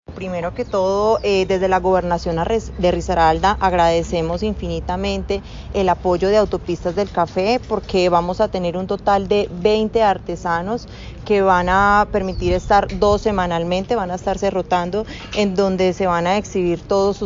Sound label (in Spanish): TAMBO ARTESANO